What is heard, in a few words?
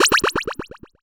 Cry